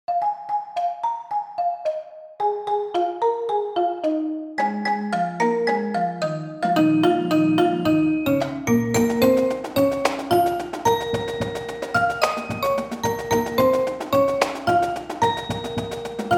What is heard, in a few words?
warm up full ensemble